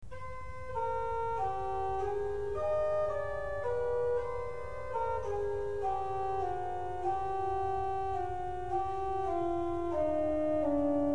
pryncypal, organy

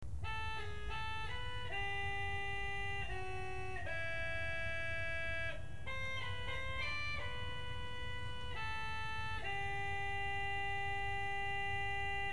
vox humana

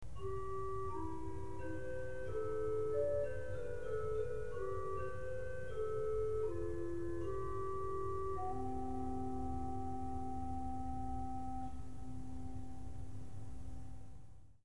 flet podwojny

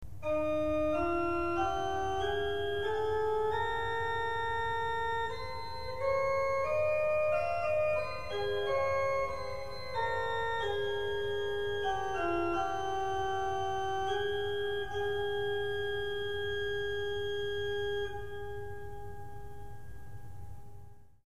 superoktawa 2 z 8, organy

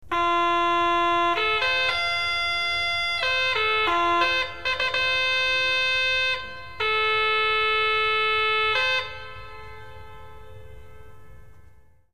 trabka horyzontalna

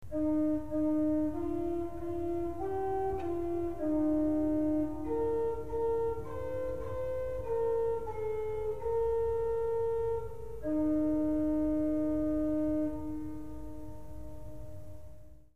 choralbas

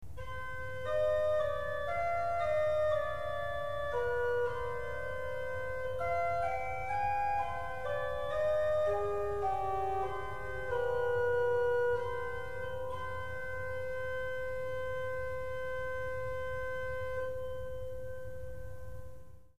kwinta z 8

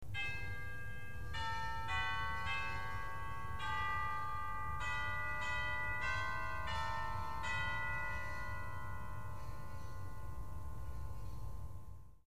dzwony

